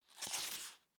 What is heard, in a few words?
Office Book Paperback Page Turn Single; Offices